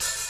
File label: muted open hat a key, Royality free open hi hat sample tuned to the A note. Loudest frequency: 5384Hz